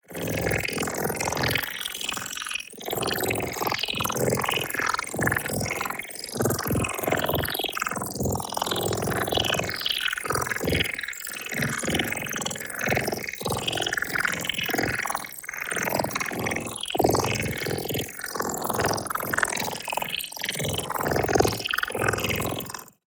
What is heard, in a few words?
TEXTURE Cybernetic Blood Flow; boom library; Matter